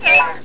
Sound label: WHISTLE